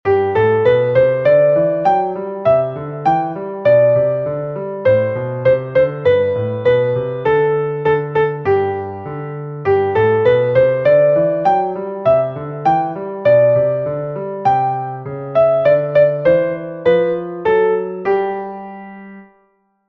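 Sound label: Etude, in G major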